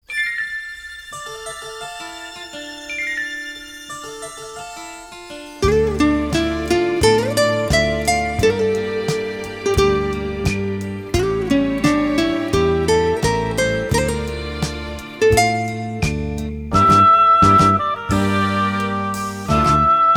saxophone

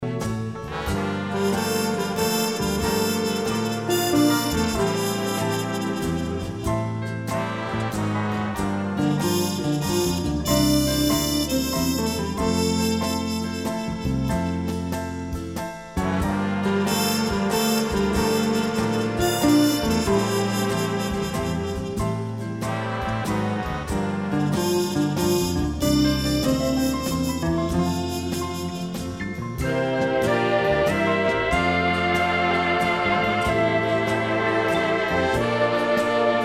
orchester, waltz

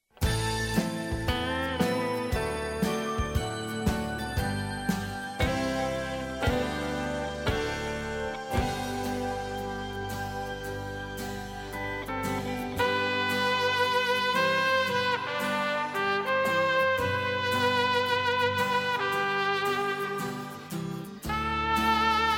trumpet

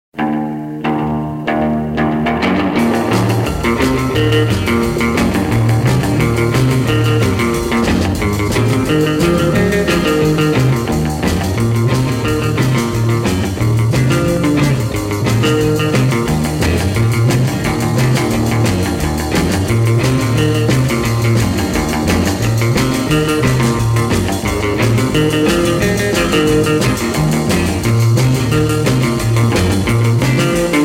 guitar boogie

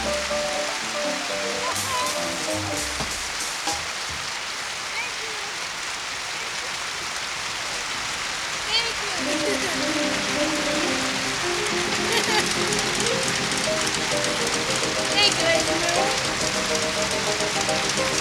applause and fanfare interlude

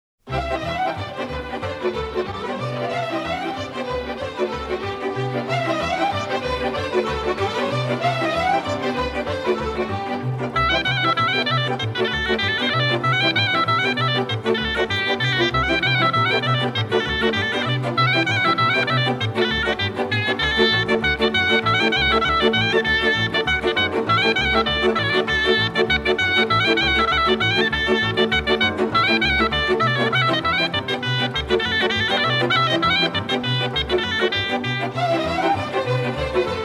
taragot, folk orchestra, rumanian folk music

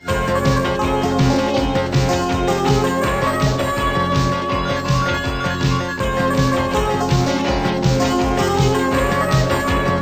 instrumental

